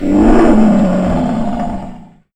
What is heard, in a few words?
Lion Roar